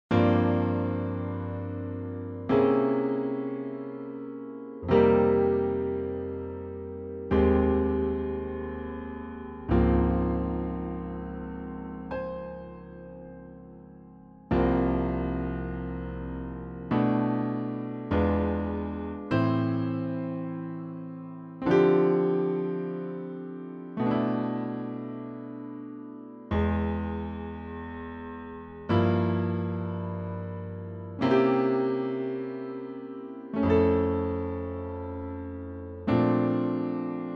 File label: Omtanke Piano; Omtanke, bara piano